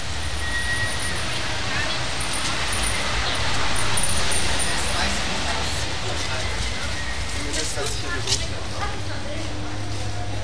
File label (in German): TREPPE; Kunsthaus Tacheles